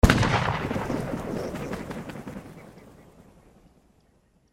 Gun; Age of Empires III; cinematics

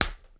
Slap Lite; Sony Pictures Sound Effects Series

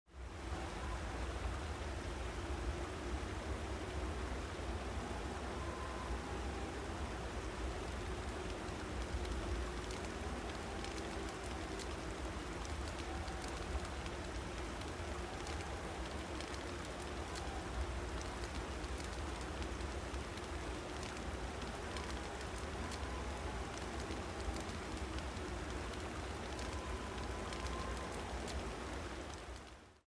Cold Ambience; Age of Empires III; cinematics

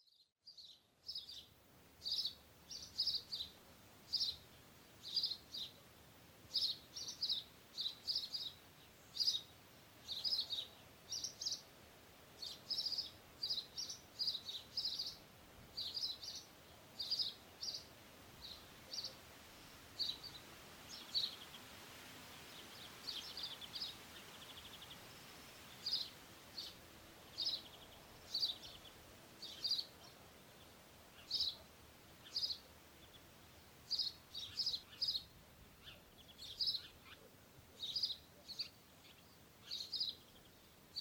Birds; Age of Empires III; cinematics

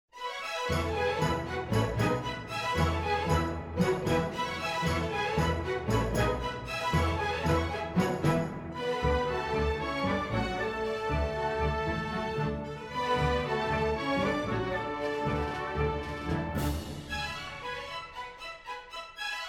Music; Age of Empires III; cinematics

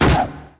nets; RYL 2

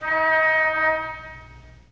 Car Horn; Sony Pictures Sound Effects Series; Period Vehicles

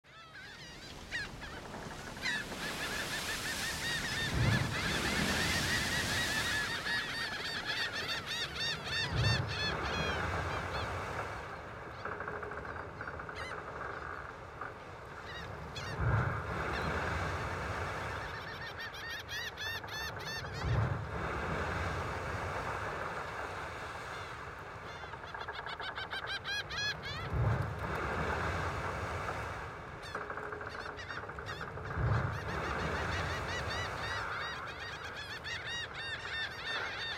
Ambient; Microsoft Studios; Age of Empires III - Complete Collection; cinematics

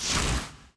shock; RYL 2 v1673

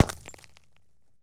Bullet Into Windshield

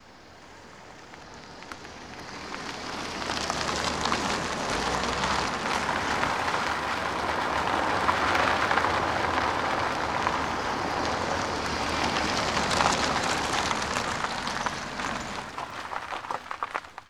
Car On Gravel In Stop; Sony Pictures Sound Effects Series

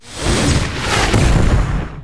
fire arrow; battlecastle

